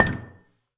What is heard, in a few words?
Gambang Soft Beater, Gamelan